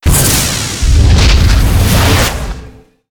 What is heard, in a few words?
razor plasma field; Warcraft 3